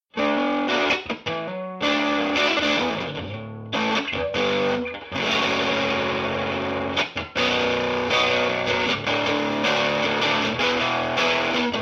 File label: overdriven